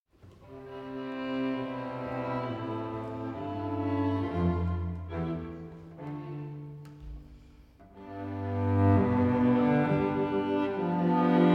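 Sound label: Beethoven trio 2nd movement; Beethoven - String trio in C minor; Festival Montalcino 2012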